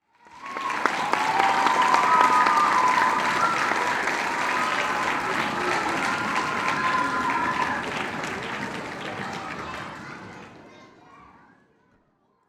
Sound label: Human Crowd Cheer